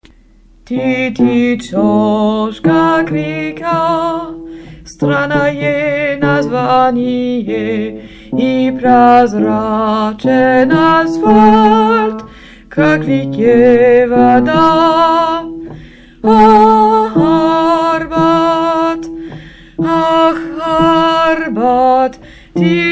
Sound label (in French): basse